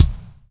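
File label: floor tom, right; Spectrasonics; Burning Grooves CD2; HIT ME KIT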